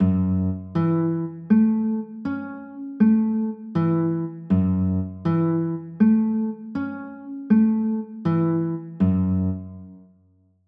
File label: f sharp m7, chords